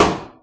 laimaytys, Clangy Crunchy Foundsound Kit

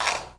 outo, Clangy Crunchy Foundsound Kit

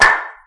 palikka, Clangy Crunchy Foundsound Kit

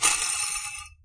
korkki, Clangy Crunchy Foundsound Kit

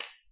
kalske, Clangy Crunchy Foundsound Kit

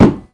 outo, Clangy Crunchy Foundsound Kit

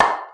palikka, Clangy Crunchy Foundsound Kit

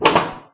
laimaytys, Clangy Crunchy Foundsound Kit

